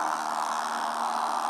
box fan, Synths; Notes

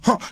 huh, Voice

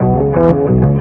organ

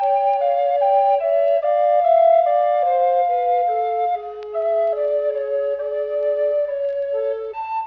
flute, Loops

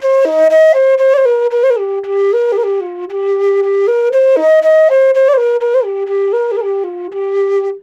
flute, Loops

